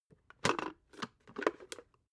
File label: water boiler open top long